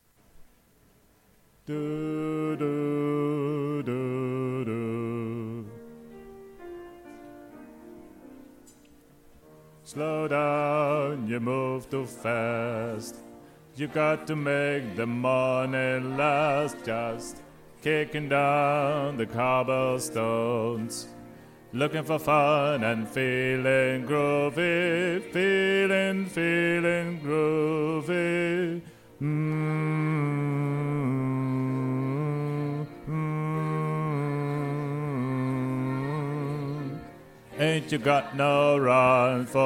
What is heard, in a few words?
bas